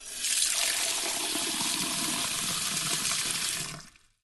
water movement; plumber soda

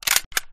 switch; bar; resurviv

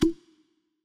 Fire Bullet

